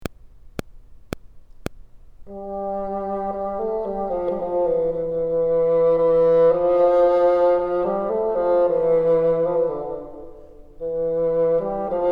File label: top, bassoon